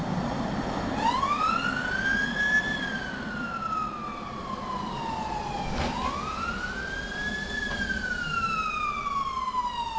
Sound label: siren